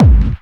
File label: Bassdrums Hard Kicks; Vengeance Samples